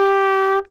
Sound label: Rubberized Trumpet G3